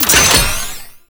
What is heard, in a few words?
Shields Up Battery Pickup; Tau; Twelve Minute War